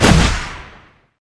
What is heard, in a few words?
power attack